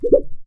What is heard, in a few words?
wobble